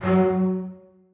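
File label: basses staccato f#1; Sonatina Symphonic Orchestra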